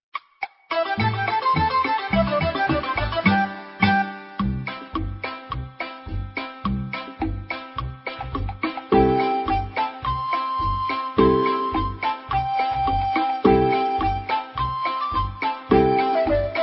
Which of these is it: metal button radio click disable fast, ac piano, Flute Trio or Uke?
Uke